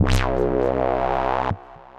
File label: Live Bass; Swoon Live Bass; Ableton; Factory Packs; Punch and Tilt